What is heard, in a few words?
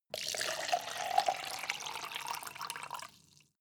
cup plastic pour